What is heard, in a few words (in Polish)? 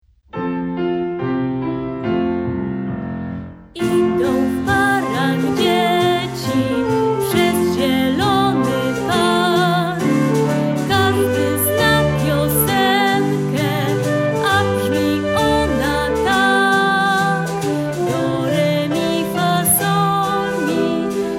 do re mi wersja wokalno instrumentalna